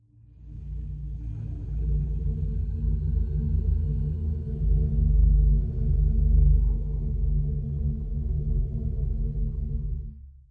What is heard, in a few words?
humming machinery; Rumbles